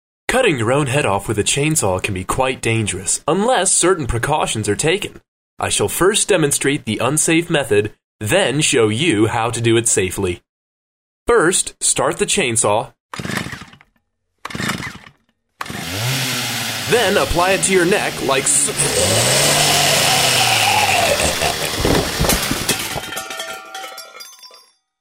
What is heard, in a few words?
Chainsaw